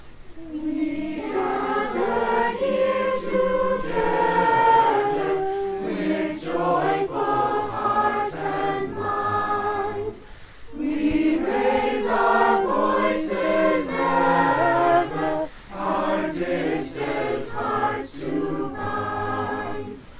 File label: Chorus; We Gather Here Together; Anonymous 16th c.; Chamber, Choral & Orchestral Music; "Music with a View"